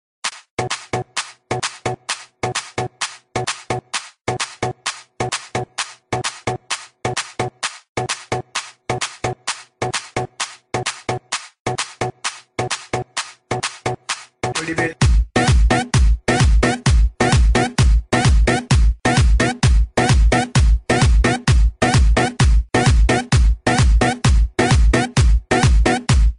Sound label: New Music For Phone